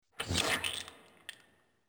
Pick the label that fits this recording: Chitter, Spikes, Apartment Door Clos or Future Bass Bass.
Spikes